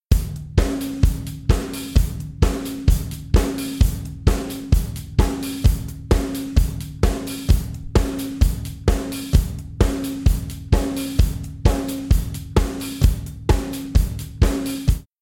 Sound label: Song Drms Mixed